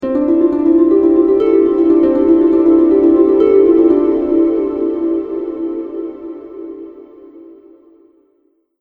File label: The Cascading Harp; Musical Sound Effects